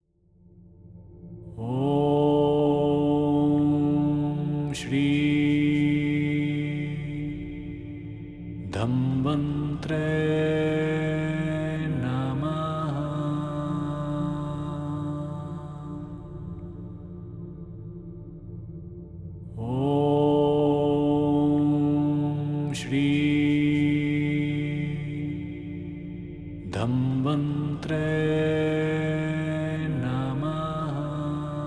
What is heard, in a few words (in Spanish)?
OM SHRI DHANVANTRE, mantras sanacion